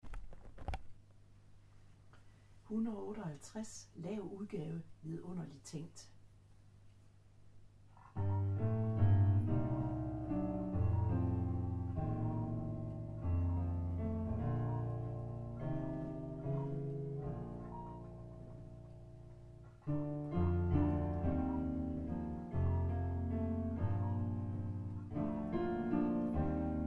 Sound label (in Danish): Vidundelig tænkt, Lav udgave, Akkompagnement